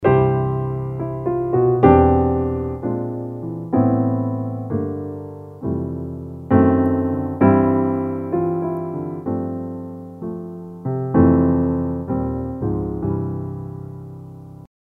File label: Jazz